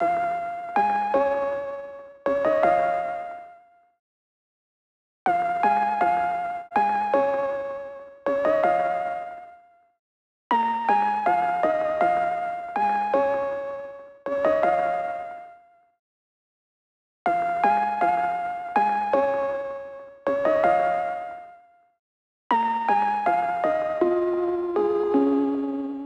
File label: 80 BPM A# Min Lead, Lofi; Melodies; Loop Stems; Cymatics; GHOST (Beta Pack); Away Again